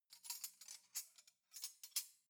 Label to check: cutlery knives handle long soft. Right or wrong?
Right